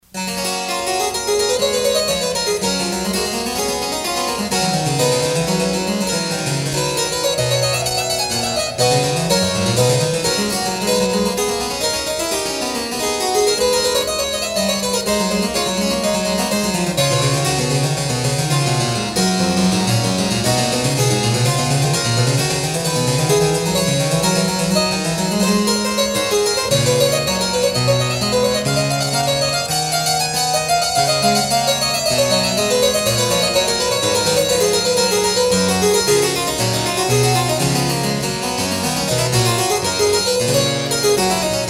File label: prelude; gibbons